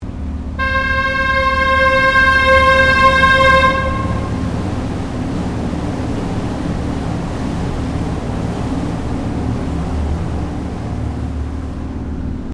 Boat Water Taxi Large Williamstown Very Slow Horn Under Bridge, Large river water taxi; Boats; Vehicles; SoundEffects-Foundation1